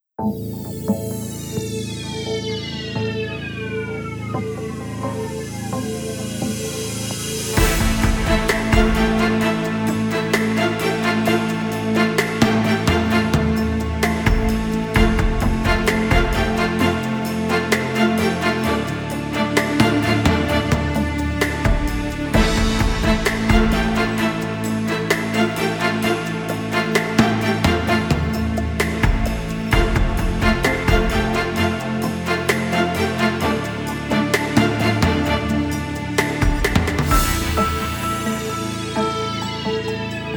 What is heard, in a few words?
Hospital Drama, NEWS BEDS